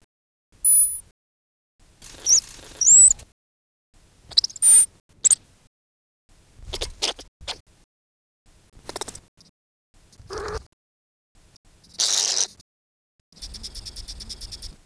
Spermophilus suslicus repertoire

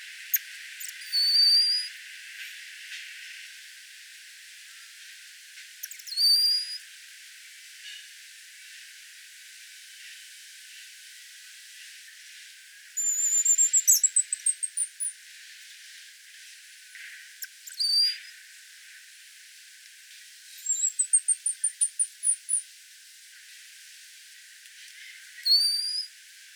Leontopithecus rosalia trill

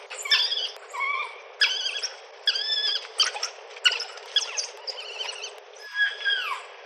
Lycaon pictus whine

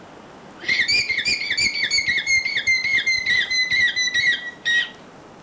Vicugna vicugna

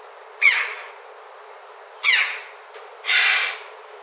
Pyrrhocorax pyrrhocorax

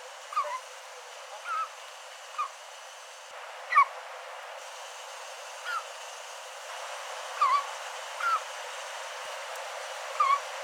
Platalea leucorodia

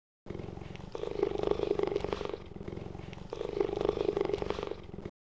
Acinonyx jubatus purring

